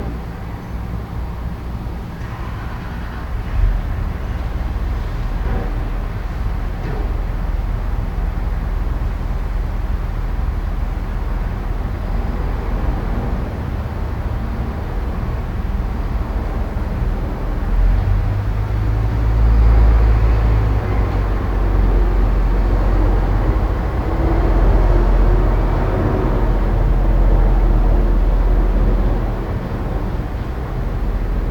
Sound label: apartment loop; SnootGame